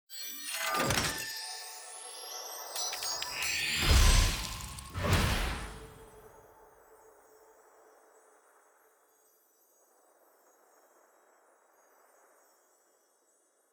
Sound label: capsule tier 1 ante; clash